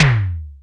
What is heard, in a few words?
DRY E TOM1; Greytsounds; Scott Peers Sound Engineering